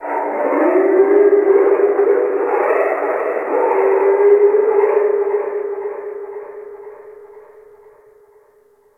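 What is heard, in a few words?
Ocean Various Creatures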